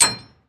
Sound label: attack; AirCraft; Game Demo